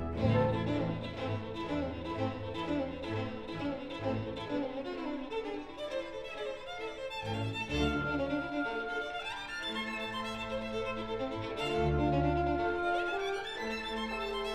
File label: Violin concerto No. 5, Allegro